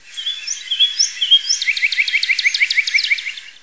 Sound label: Northern Cardinal